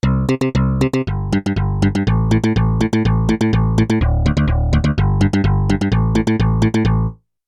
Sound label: DX-Bass C1-C3, Yamaha TX16W; Combo Performance 1